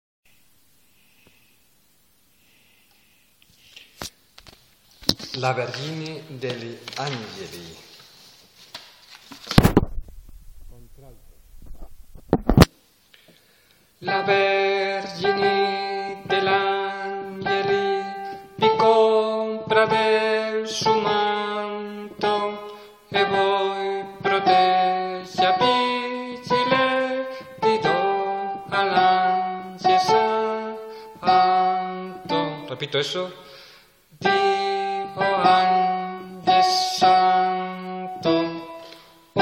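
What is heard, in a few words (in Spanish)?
la virgen alto; Valladolid; La forza del Destino (La Vergine degli Angeli); Orfeón de Valladolid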